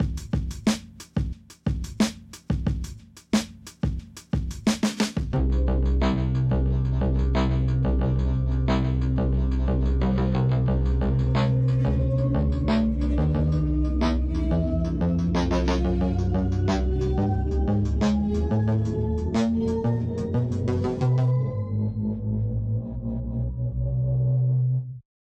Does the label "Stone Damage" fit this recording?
No